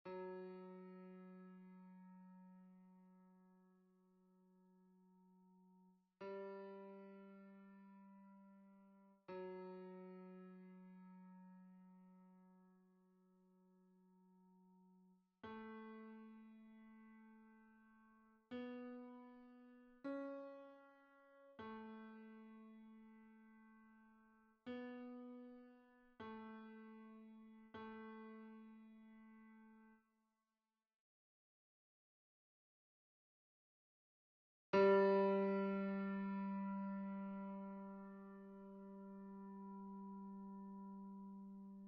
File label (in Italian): tenori piano